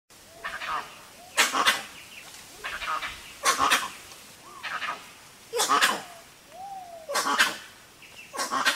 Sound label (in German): meerkatze; zootiere erkennen